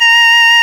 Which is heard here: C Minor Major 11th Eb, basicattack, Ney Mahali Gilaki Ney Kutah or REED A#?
REED A#